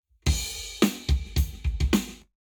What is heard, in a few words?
Drums, 0deg; Lab Wet